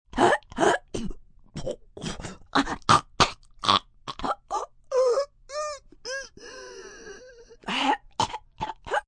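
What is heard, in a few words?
Choke Panic female, Choking, female, panicking, gagging, gasping; human voice; Sound Effects:Voice; VoiceBox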